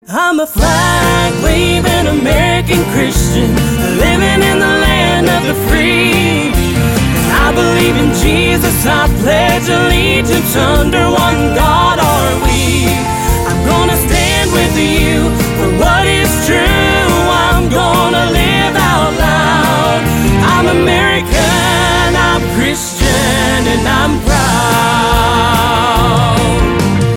American Christian

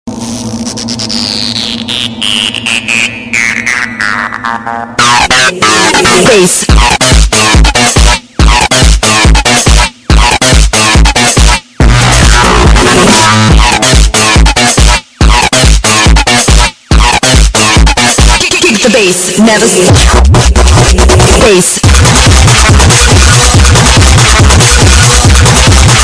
Bass

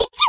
Sneez

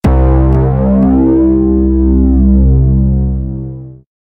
Awesome Sms Tone

